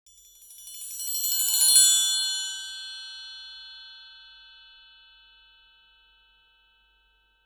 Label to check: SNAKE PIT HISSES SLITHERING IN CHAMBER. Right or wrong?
Wrong